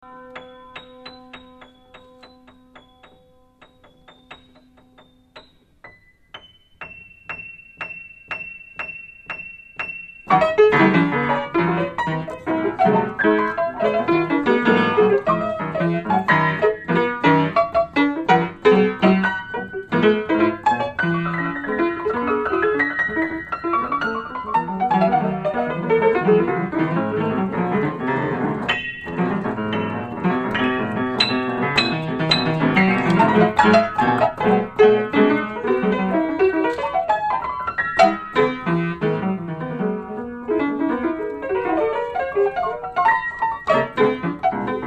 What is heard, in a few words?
piano